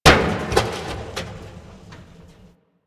destructible metal barrel

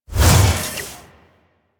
Mech Melee Swing; Nechro

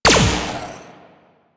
Laser Skin Printer Laser Start; Ambience; Props; Warframe; Entrati

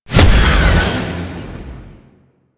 Impact Small; Weapons; Warframe; Duviri; Incarnon Adapters

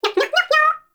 baby vocal singing

